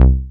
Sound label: Basic Bass, FM; Polyend